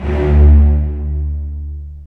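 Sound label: STR, strings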